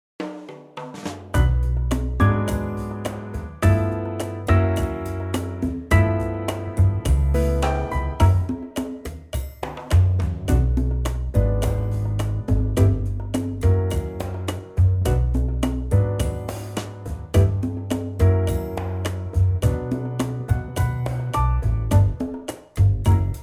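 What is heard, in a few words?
105 bpm, backing track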